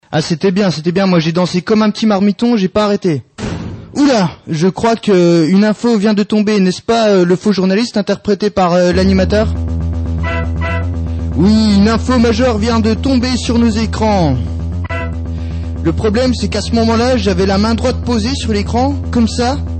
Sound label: transition